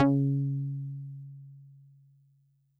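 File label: Solid Bass DX100 C#4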